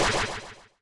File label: shot; ZombieBattle